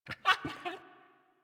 Puppy Idle Speak Playful; Enemies; Kubrow; Warframe

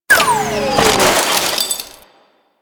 Menu Scrap Drone, UI; Warframe

